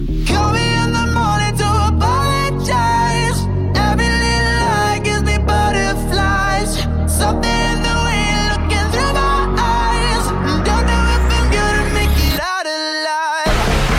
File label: Ringtone